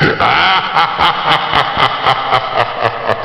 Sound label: morph